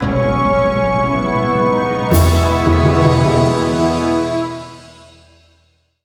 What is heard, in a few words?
Music Logo Sting; Menu FX